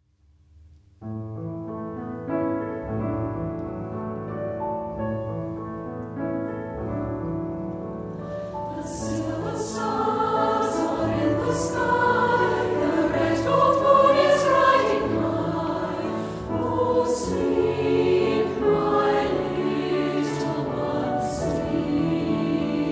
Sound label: Choir; The Silver Stars; Brisbane Concert Choir